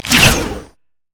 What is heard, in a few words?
pick a card blue card; LoL; twistedfate